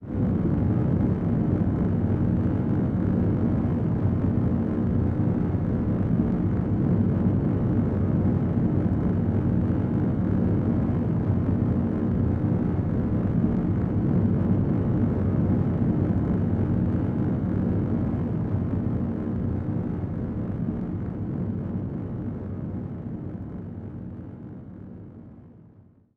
Rocket Long Idle Loop

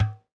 Tennis Racket Ball Hit Light

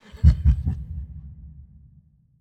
Laugh; Spinal Serve; Wieldables

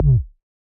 Ball Collision Forcefield Light

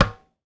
Tennis Racket Ball Hit Med

